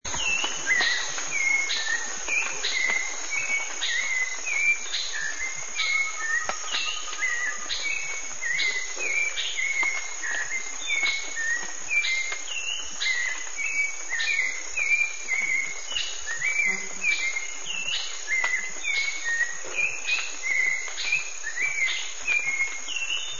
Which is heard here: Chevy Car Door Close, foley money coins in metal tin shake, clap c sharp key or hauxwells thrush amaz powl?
hauxwells thrush amaz powl